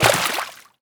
water entry; player

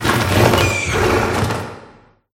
headcrab scuffle; scripted sequences; distillery

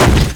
vehicle impact medium; Half Life 2

